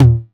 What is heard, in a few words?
909 TOMS